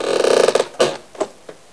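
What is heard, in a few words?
squeaky floor, ambience; blackops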